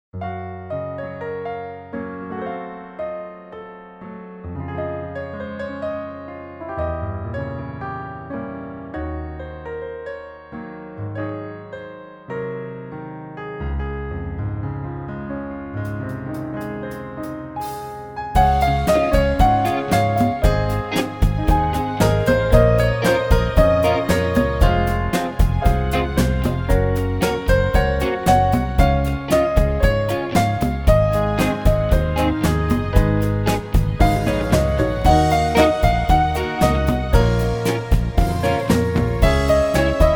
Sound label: melody only